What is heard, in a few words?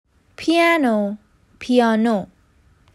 Piano